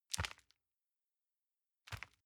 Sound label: envelope grab soft